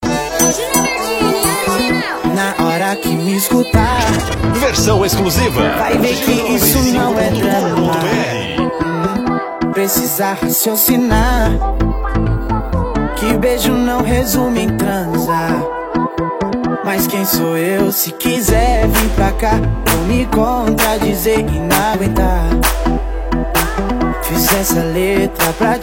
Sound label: Funk Production Remix